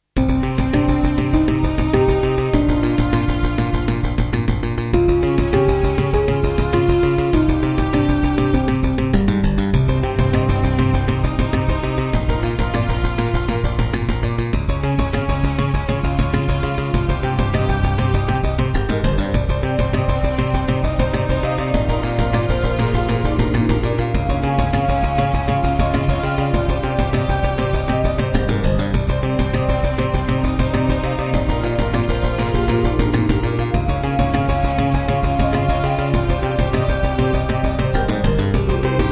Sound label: Alesis NanoSynth; Hocus Pocus